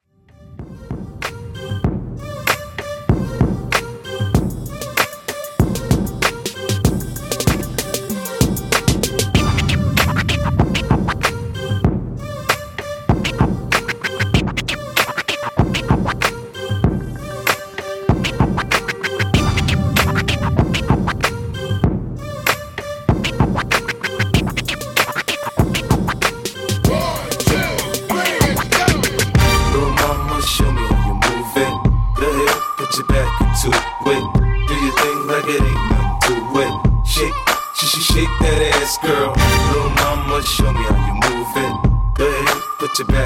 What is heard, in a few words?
Party Intro 96 Bpm